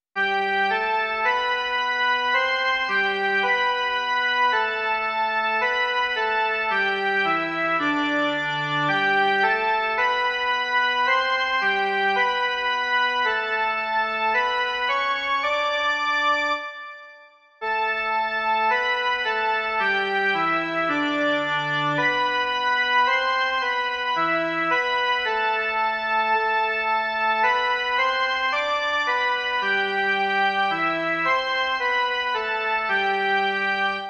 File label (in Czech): Otče náš, kéž dnes dáš jen melodie